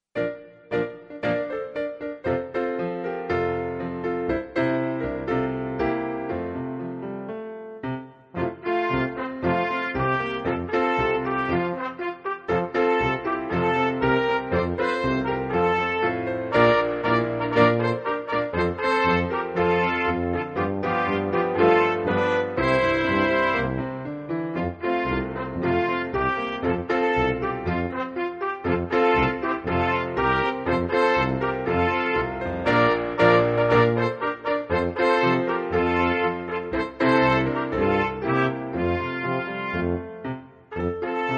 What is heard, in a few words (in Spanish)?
vienen con alegria accomp